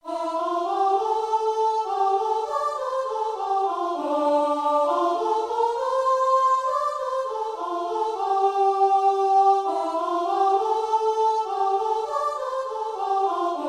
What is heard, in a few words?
danny boy, keyboard